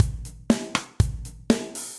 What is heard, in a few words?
Kit Big Punchy, Drums; MPE Kits; Ableton; Factory Packs; Session Drums Club